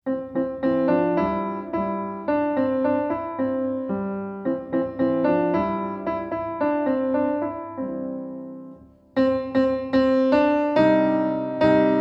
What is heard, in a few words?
piano